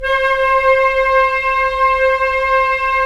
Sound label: Flute, Roland LA Composer